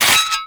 Bird Three Scratch; Warframe; Entrati

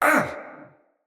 Pain, Battle; Mendicant Wazard

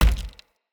Husk Impact Kick, Unarmed; Weapon Impacts; Enemy; Mockery Husk

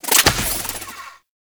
Shotgun Glaive Return Mech; Warframe; Tenno; Alchemist

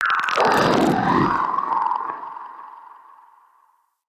Shrimp Vocal Alerted, Creatures; Baliq Shrimp Glade

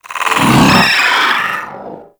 Pet Vocal Pain; Warframe; Alad V Pet

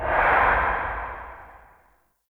Low Mech A, Ambience; Warframe; Nokko Colony

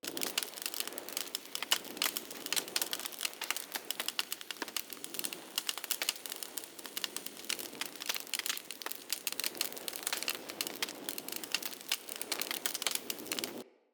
Fire Hazard Burn Pile Medium Loop A; Undercity

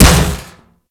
Impact Metal Heavy; Furax; Impacts; Weapons

